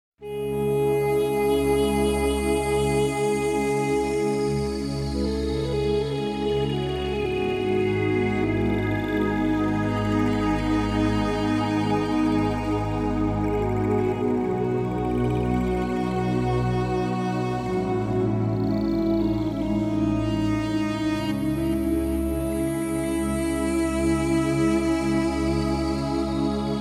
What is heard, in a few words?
Ringtone Mobile